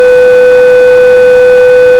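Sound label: tone 500Hz with all harmonics present; message in a tone 500Hz with all harmonics present; It is a 500 Hz tone.; the embedded message recorded from the ether; Notice the 500 Hz peak present and all its harmonics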